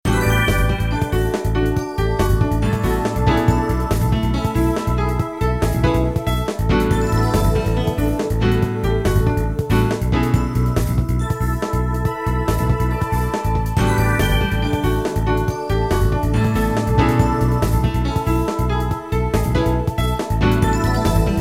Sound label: Music Main Menu; MKDD